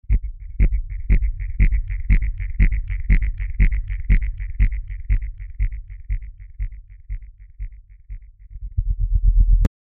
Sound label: chase tension shaky echo